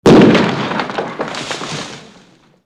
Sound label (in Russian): vistrel